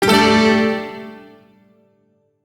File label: Shawzin Pent Maj Chord; Music; Warframe; Duviri; Chords